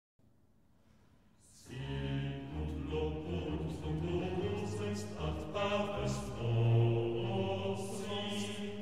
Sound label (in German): Musikbeispiel; Bach